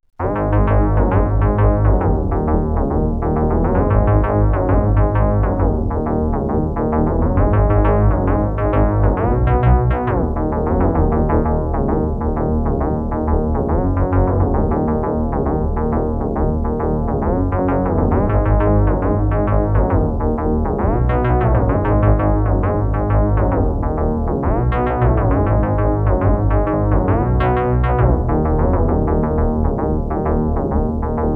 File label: bass